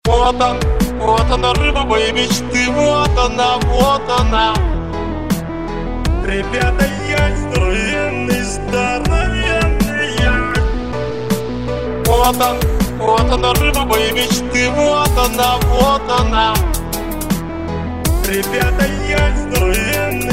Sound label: Hard Dubstep; prikoly